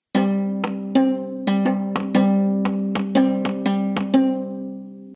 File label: Ngoni